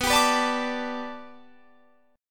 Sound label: B Minor 7th Sharp 5th B Guitar Standard, chord; Bm7#5; strummed; 19,22,21,19,22,22; JGuitar